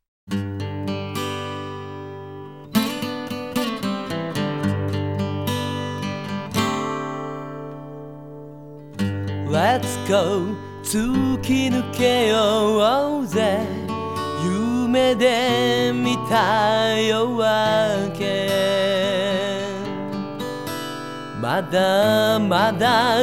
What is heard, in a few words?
guitar; Macross 7